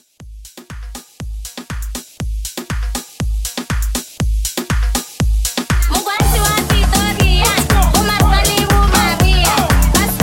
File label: Party Break Intro